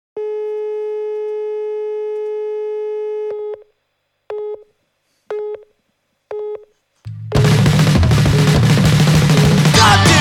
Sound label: Electric Guitar